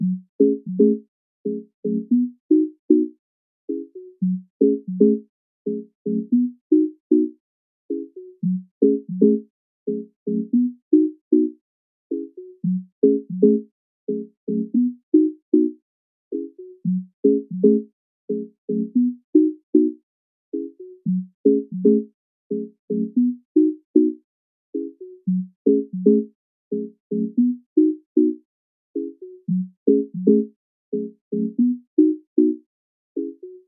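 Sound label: Keys Loop C minor 114 BPM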